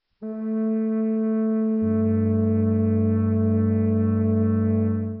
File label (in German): flöte